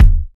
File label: subby kick drum b key, kick tuned to the B note. Loudest frequency: 118Hz